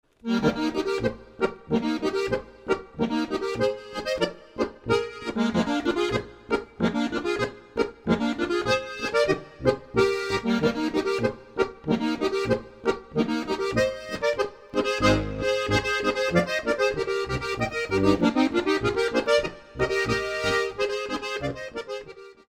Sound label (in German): Boarischer